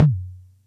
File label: Percussion Computer Tom; Atlantex MPC-1